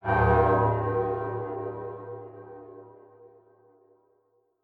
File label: teleport